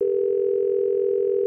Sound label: Dialtone